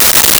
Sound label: Open Hat; Nintendo; July 2020 Gigaleak